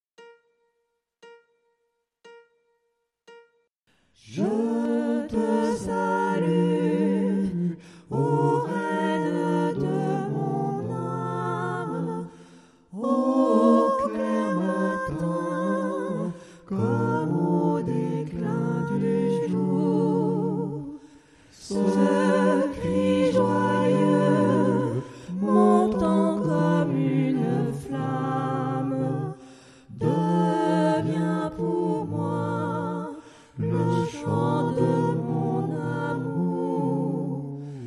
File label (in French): salue tous, chants